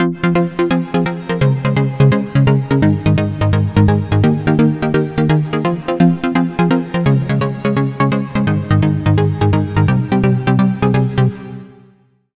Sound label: Pluck; Routed Sound; Sylenth1 Expansion - Star Scavenger Vol.1